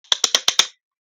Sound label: keyboard banging